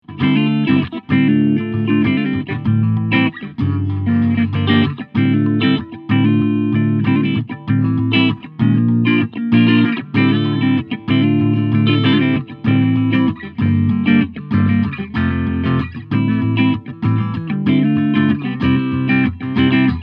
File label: Supro Delta King 12 Combo Clean; BC Clean Bass Full Mid OFF High Full